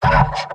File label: m laugh short; pyro; tf2; garrysmod; mvm